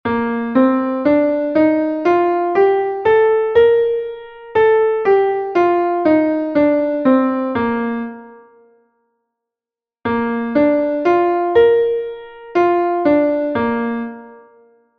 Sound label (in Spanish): escala arpegio sib M; Entoación a capella